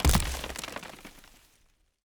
foliage break

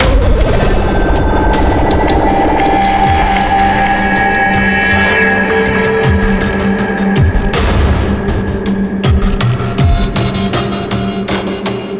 Victory Intro; It Came From Space and Ate Our Brains

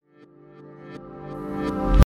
Race Start; Summer Catchers

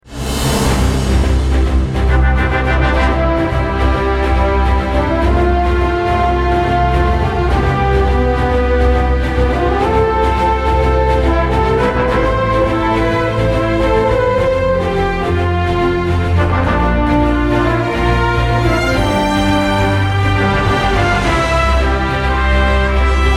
Big Victory; Manamon 2

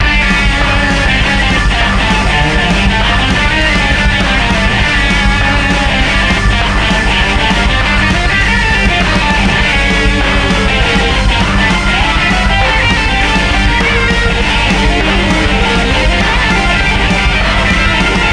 Normal Boss Battle; Shin Megami Tensei Devil Survivor Overclocked; 3DS

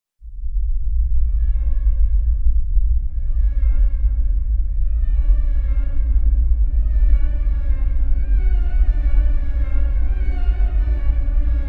Swamp Horror; barrow hill

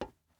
glass step; teardown ps5 windows xbox series xs gamerip 2022

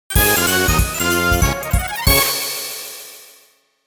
Get Item; Mario Party 2; N64

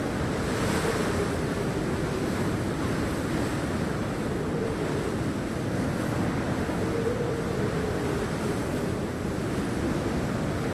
Ambience Strong Wind

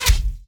Bow; ChooChoo Heroes

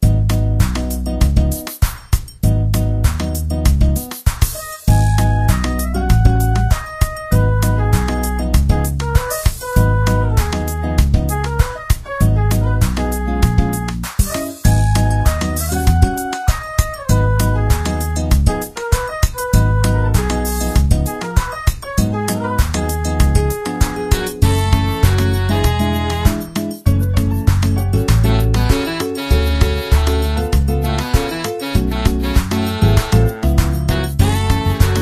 electro loft radio

